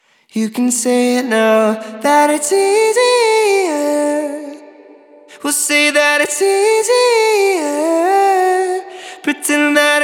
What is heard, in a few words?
Male Vocal; Master Room MR-III